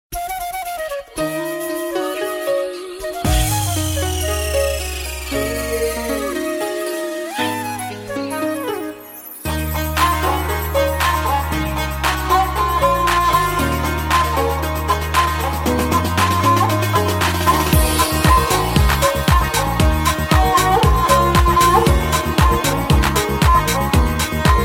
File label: Hip Hop Folk Mix